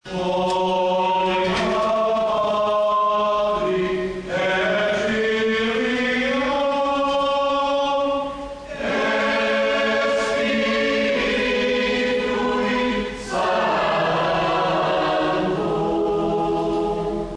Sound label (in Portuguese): cantigas missa do galo